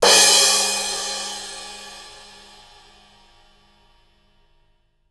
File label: sfx perc